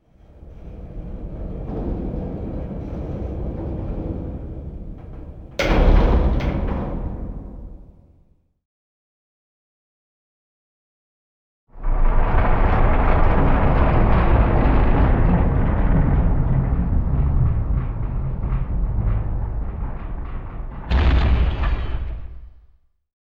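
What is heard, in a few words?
Hangar being closed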